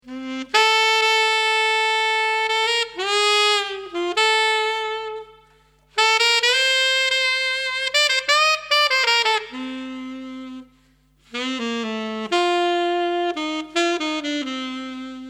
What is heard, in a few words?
studio Alto